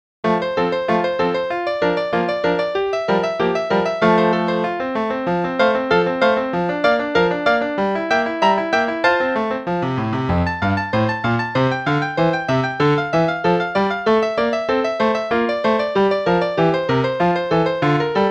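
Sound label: Play-a-long, Little Prelude in F, quarter note=96; Bach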